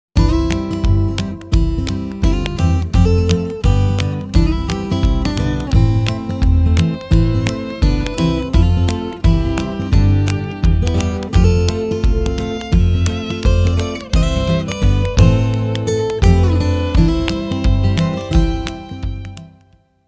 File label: down home, guitar